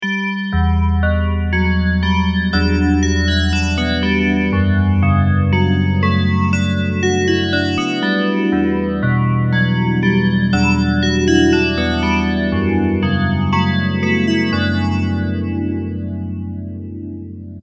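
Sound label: DX7 bells, phaser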